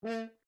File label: HORN A4 MF STACCATO STOPPED, French Horn in F; Alexander French Horn in F; Musical